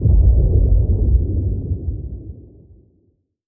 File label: thunderclap outside; Hearts of Iron IV Death or Dishonor